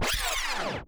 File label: back spin med, vinyl record